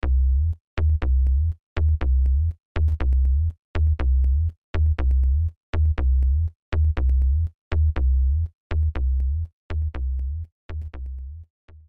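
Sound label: bass compressor; compresssor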